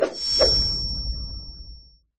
sword; FreeKill; standard cards; qinggang

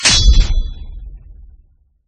double swords; FreeKill; standard cards

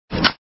equip

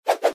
throw shoe

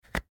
equip

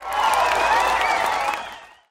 throw flower

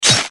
damage

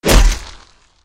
normal damage; FreeKill

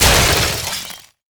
damage ice

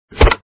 equip

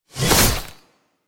ice damage; FreeKill

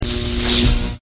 thunder damage; FreeKill

